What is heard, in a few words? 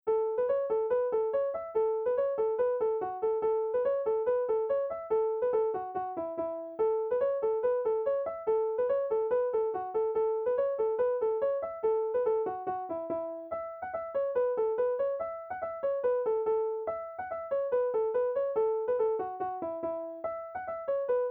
session, irish music; britches full of stiches